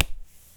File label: Slap the Melon; Micro Pack; CaptSubtle